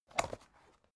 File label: folder open